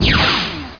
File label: PLASMED